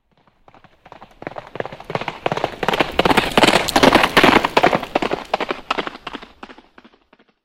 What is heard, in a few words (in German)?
Galopp